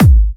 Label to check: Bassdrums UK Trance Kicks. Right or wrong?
Right